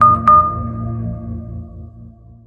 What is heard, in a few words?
Notification Ringtone; Vivo T2